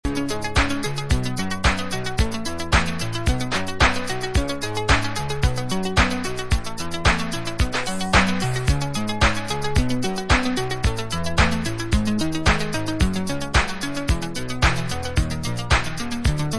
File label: instrumental slow